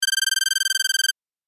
Old cellphone ringing single

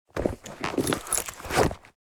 Purse putting item in

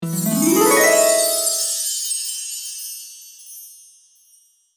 Fairy godmother arrival

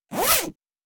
Small handbag zipper

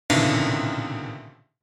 Crash on piano strings

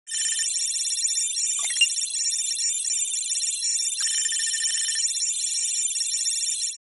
Digital hologram interface data searching

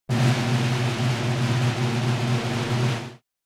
Cartoon comedy drum fill

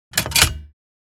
Casino slot machine pull lever one armed bandit

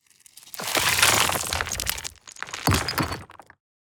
Stones on gravel and dirt; mud and dirt

